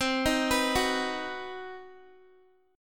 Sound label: C Minor Major 7th Flat 5th chord, Guitar; arpeggiated; JGuitar